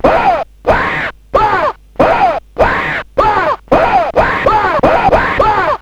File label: uhh